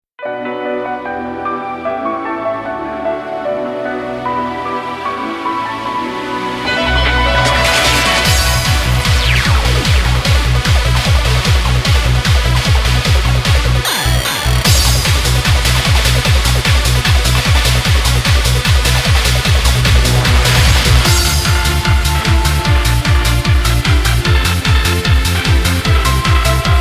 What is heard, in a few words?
karaoke; sdvx